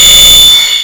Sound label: e Laser; 3DShooting